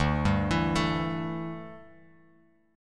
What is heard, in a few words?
D Suspended 2nd Suspended 4th, Bass Standard, chord; arpeggiated